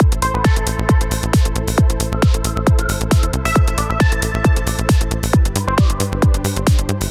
Trance Loop

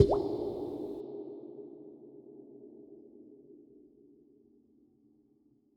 Tunnel Drip Hit

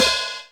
Hat up

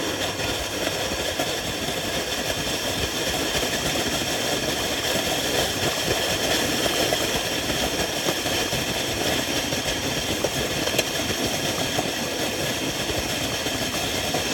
Fast Sliding Toboggan Looping

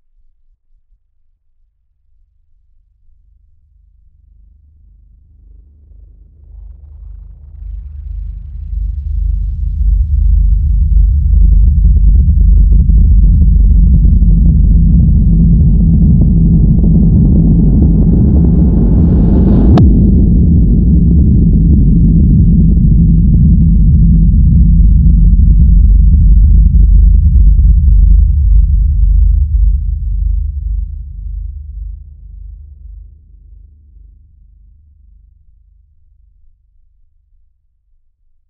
Synth

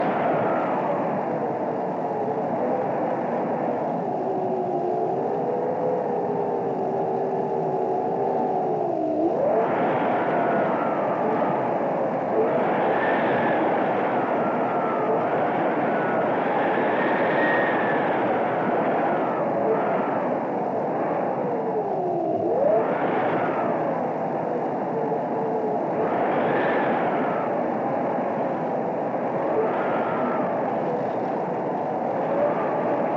Blizzard Wind Cold

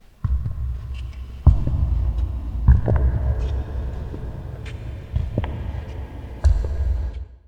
Scary Pounding

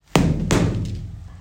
Left Hook Uppercut

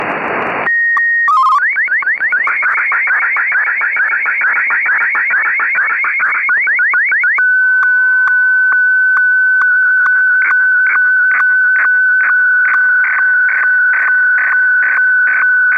SSTV 50 lines

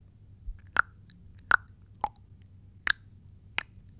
Clicking Tongue

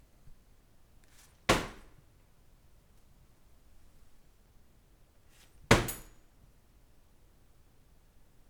Bag Drop

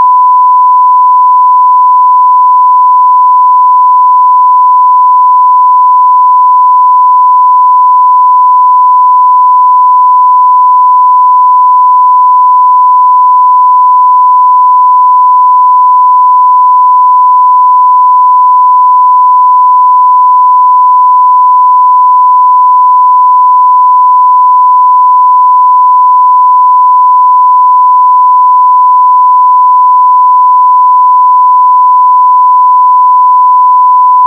1khz

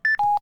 Phone Call Fail

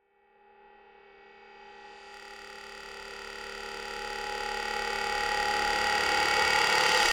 Fade In Rise Up Stutter

